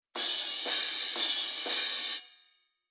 Metal Crash, Rhythm

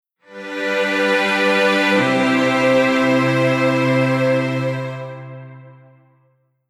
Strings

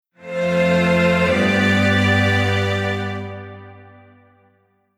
Strings; Wasei Kinsoku Renzoku5

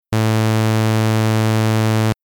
Synth Additive Saw Only; Other Wave

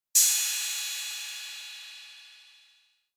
Electro Crash, Rhythm

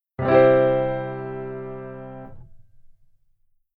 Keyboard Arp

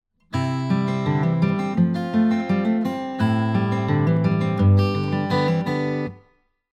guitar 3 finger

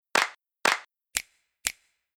Rhythm RnB Sample Clap

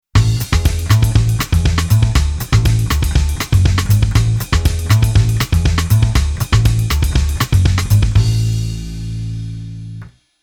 Bass Arr Samba

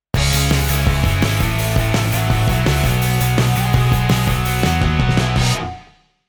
guitar pattern

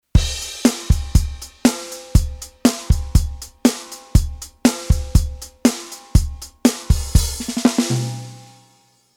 Rhythm Kit Pop

